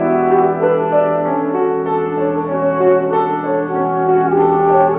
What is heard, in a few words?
horns, Loops